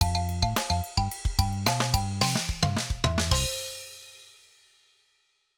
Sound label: Jingle Win